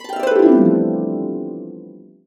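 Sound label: C major, harps; USB Soundscan vol.62; Complete Harps; AKAI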